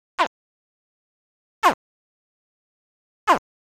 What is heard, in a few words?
Spectacled call